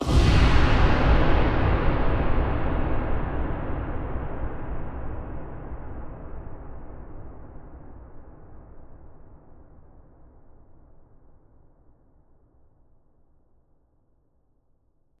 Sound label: Huge Verb Tail, Long Reverb Tails; impact samples